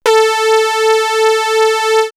synth brass, horn section; X-Static Goldmine; backtothe70's

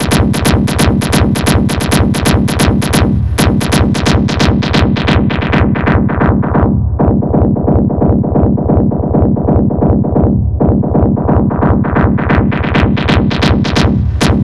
Stab 133 C; 90 sSampleCDs; Club Techno

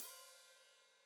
Hat Foot Open, Hi-Hat; Deeply Sampled Drums

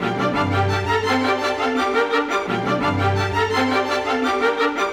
Orchestra; Sonic Foundry (Sony Creative Software); Orchestral VOL-2; Modern 09

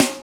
SNR DANCEH, drums; AMG; Now CD-ROM (Roland)

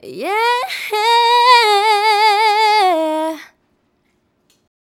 Female Vocal; Vocals; Sonic Foundry (Sony Creative Software); Mac Money

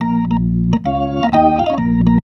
B3 LICK A#, PLAYED

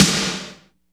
SNR, Tekno Industrial; East West

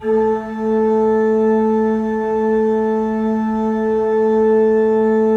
3D Organs Pipe Mix Celeste

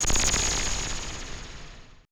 AMBI FX

